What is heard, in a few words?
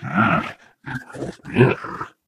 ballbot caution